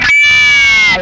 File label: whammy fx, guitar; 90 s Sample CDs; Zero G - Funk Guitar